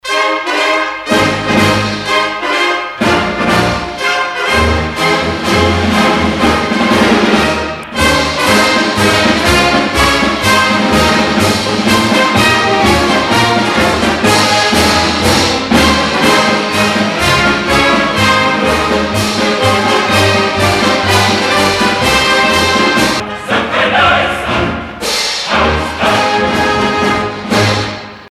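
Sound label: sports march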